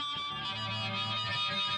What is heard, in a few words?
Bottle Neck